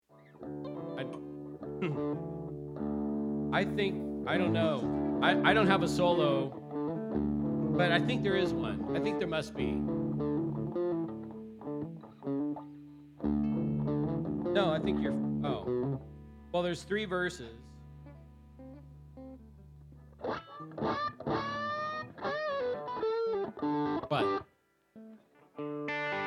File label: vocals